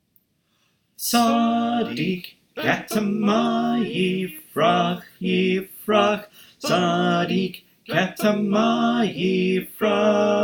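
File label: Tenor, choir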